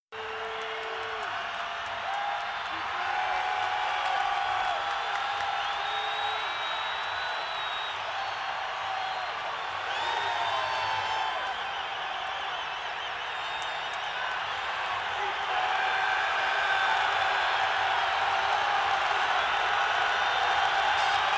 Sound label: Football Crowd Defe, sports arena football